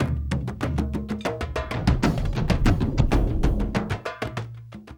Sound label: Doong A Boong, Drums; Sonic Foundry (Sony Creative Software); Friendly Fire; Marc Steve